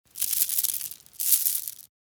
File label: curtain jade